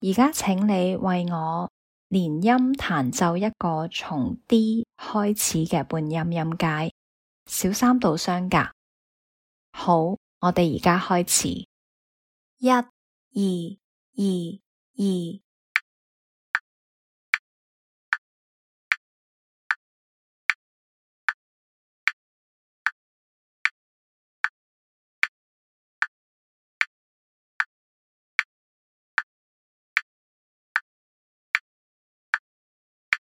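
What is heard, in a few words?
chromatic scale a minor third apart starting D in legato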